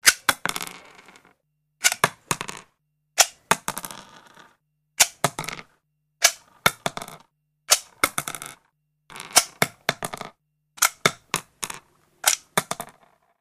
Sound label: Eject Ammo From Clip, handgun